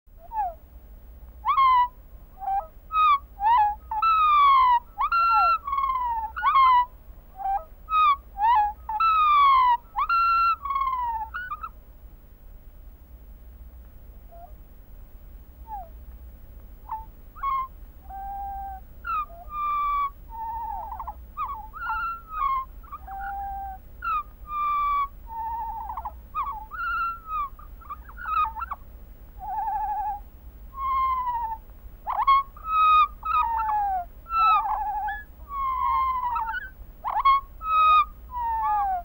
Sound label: concerto for birds and orchestra; cantus arcticus; royal scottish national orchestra